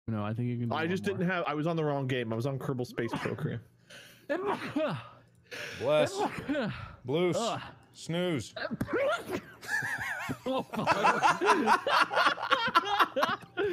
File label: sneezes in autotune